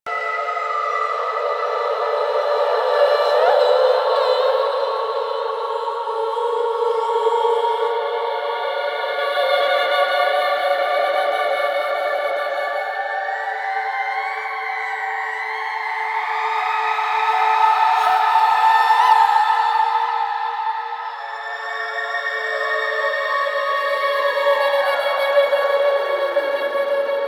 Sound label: Angel Song Calm; Warframe; Quests; Zariman